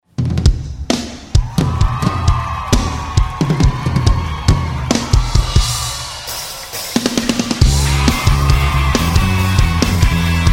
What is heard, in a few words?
rock roll live